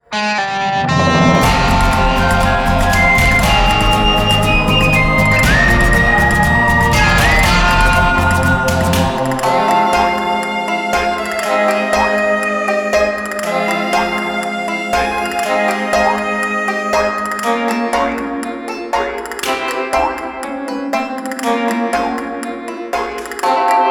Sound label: Character Theme; Awesomenauts Ultimate Edition; Sherriff Lonestar